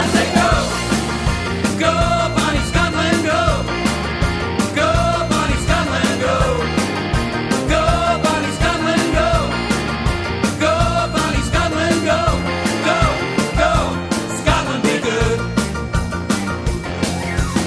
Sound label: Scotland